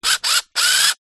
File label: science fiction robot motor server movements